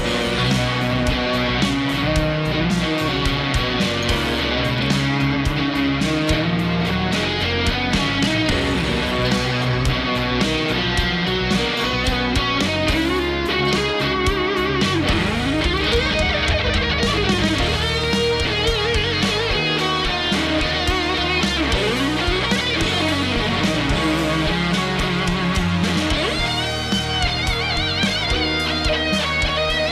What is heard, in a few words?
Guitar